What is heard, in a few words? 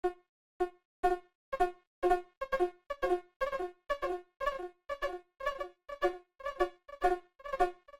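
sitar gentle melodic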